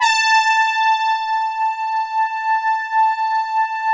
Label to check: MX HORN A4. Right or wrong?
Right